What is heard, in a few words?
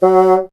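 wind bassoon F#; Miroslav Vitous - Solo Instruments VOL-1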